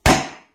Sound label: Hitting Table Message